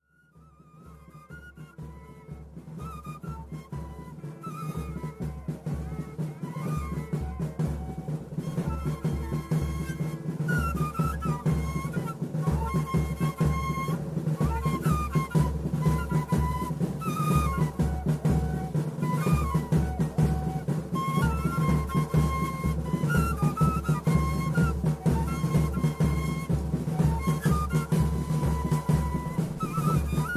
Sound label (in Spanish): Toque Pasacalle para Personas